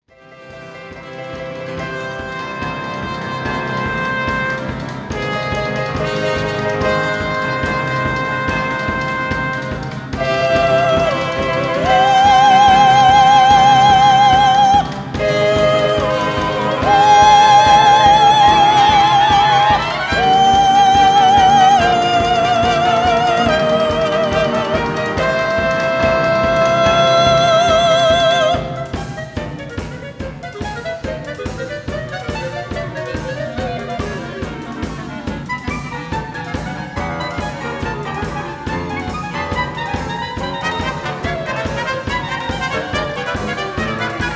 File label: looping